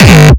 kick

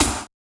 Yamaha DJX 2B, SD Hip Hop

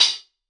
Solid Quartz, Hats; Airwindows

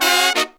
132, Pop & Funk Brass

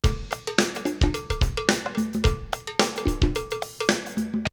Drumloop; ZERO G - Datafile DISC 3

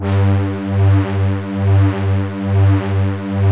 PADS; Pro-Rec - Synthsonic; Z1 PADS

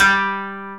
KOTO G2 -L; USB Soundscan vol.60 - Asian Traditions; AKAI

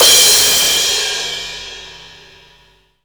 CYMBALS, Disco House

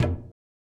low tom; Giga Samples Collection; EMUSP12

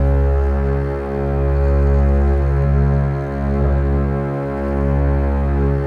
C#1, Contrabasses

